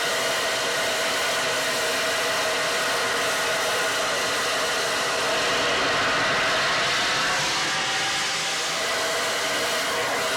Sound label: tools extinguisher loop